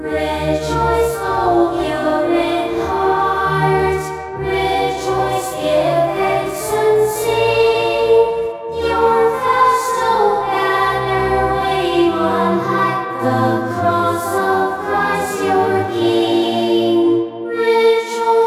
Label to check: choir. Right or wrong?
Right